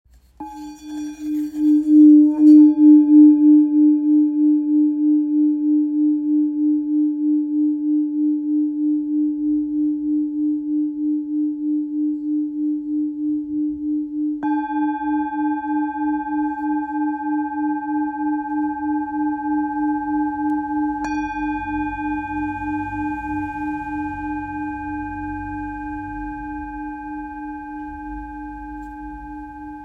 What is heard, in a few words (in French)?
Bol grave tara re diese